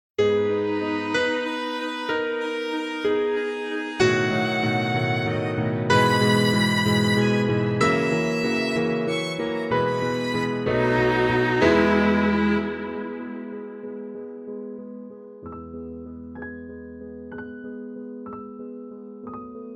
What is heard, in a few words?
ringtone; beethoven